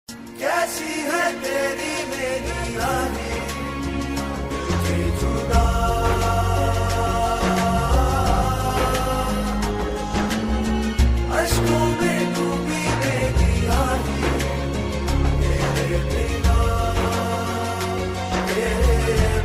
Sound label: Ost ringtone; Hum kahan kay Sachy Thay